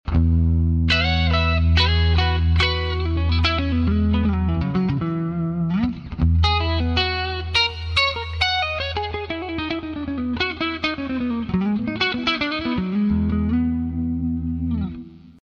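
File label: clean style, guitar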